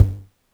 polyvox bassdrum, Drum Machines, Formanta Radio Plant Polivoks